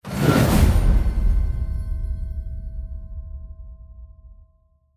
Victory result

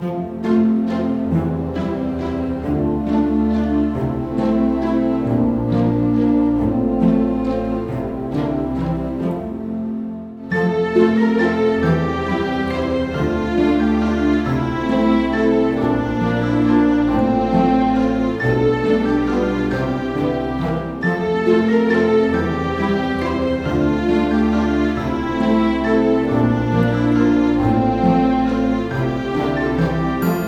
English Waltz Loop

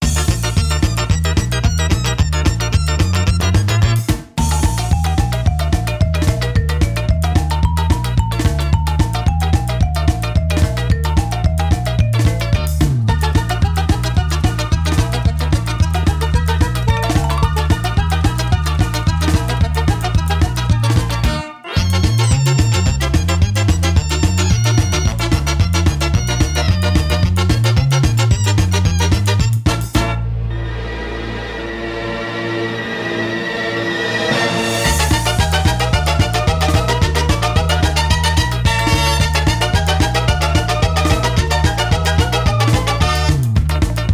Ska; SpongeBob SquarePants Plankton's Robotic Revenge; Wii; Mansions

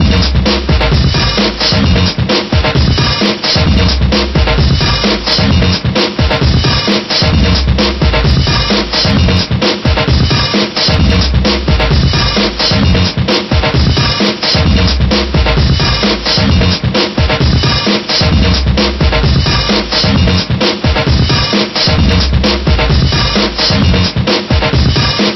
Ditty; Fox Sports NBA Basketball 2000; PS1

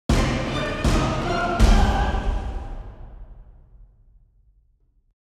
level up; I Shall Remain

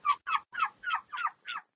Gosling Run Loop

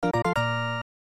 Item Found; Sword World SFC 1993; SNES

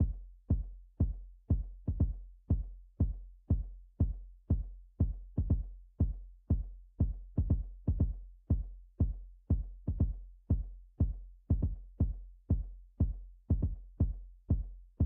KICK; rain world

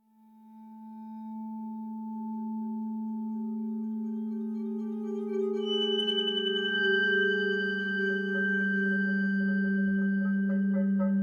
env forest wakeup; the walking dead michonne 2 give no shelter

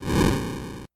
Npc Fireball

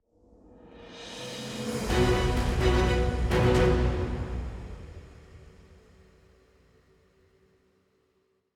BGM Ingame Match Over

